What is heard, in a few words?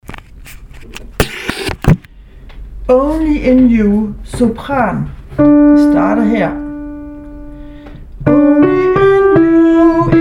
only in you sopran